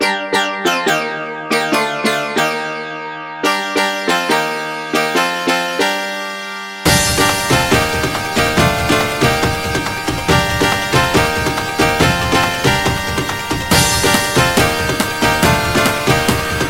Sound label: Dubstep